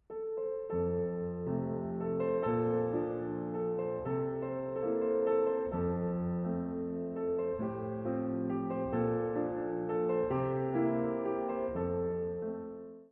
Valse de la libellule, piano

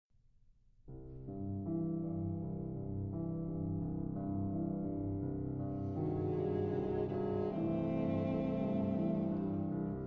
Quatuor piano; Paris : Heugel, 1946; 4. Allegro assai

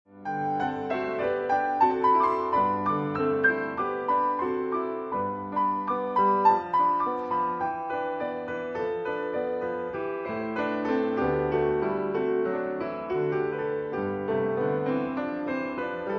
berceuse, piano 4 mains

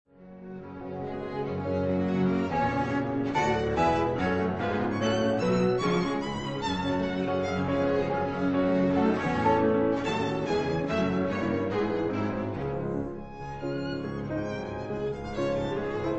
Quintette

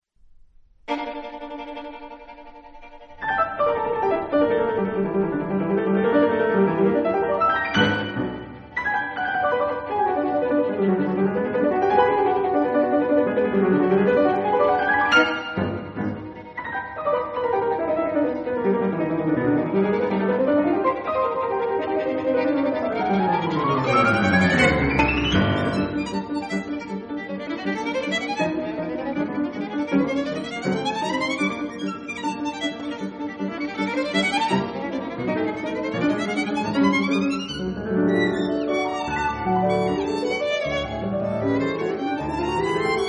Sonate violon piano; Sonate, violon et piano (Paris : Heugel, 1927); 2. Véloce

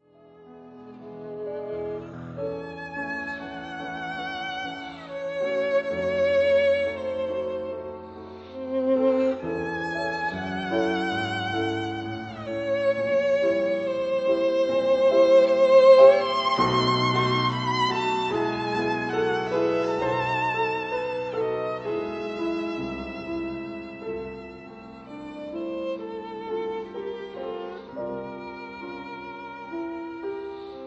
Nocturne violon piano

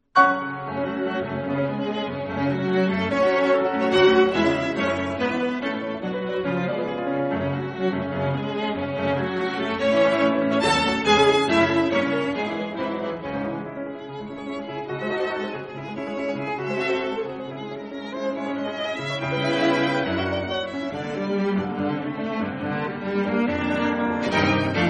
Quintette; Quintette en fa dièse mineur, piano et quatuor à cordes; (Paris : Heugel, 1923); 2. Andante (non troppo lento)

